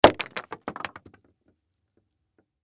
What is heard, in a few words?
Food Coconut Drop; SoundEffects-Blastwave